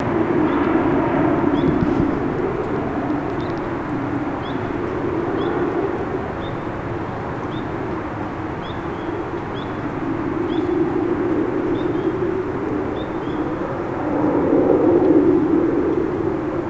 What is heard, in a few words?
pseudacris crucifer; iNaturalist